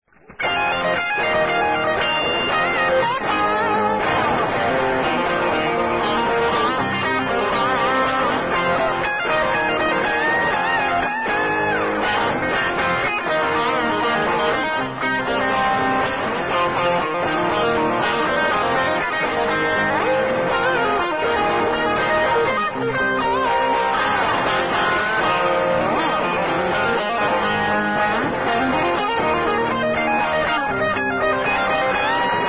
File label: pentatonic solo fast F